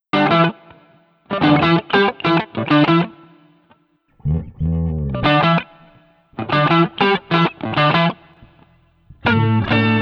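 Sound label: Street Guitars Funk Steady no Drums, 94 bpm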